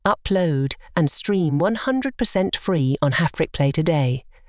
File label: Jingle; Hafrikplay